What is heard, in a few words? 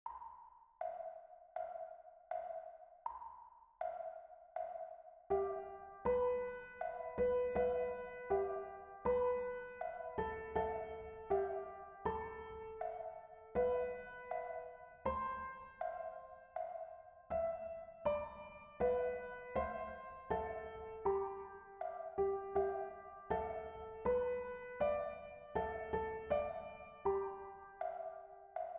Blow boys blow melody; Seemannslieder; gitarre guitar